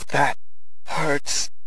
hurt; fgrunt

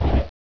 body kathump

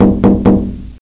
glass

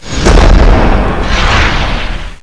shootdodge, slowmo

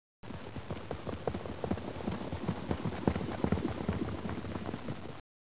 western distant gallop

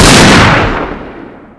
psg fire

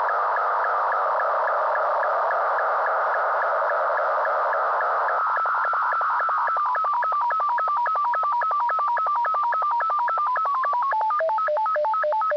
comm amb, ambience

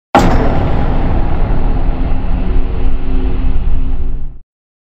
death; sekiro

